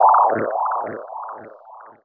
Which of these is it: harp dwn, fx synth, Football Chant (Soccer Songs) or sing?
fx synth